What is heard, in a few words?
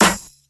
high quality snare drum sound f key, snare single hit tuned to the F; snare-drum-samples